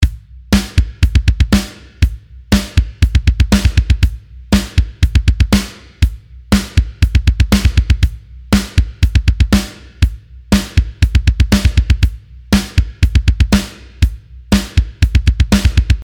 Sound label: Double Bass Beat, drum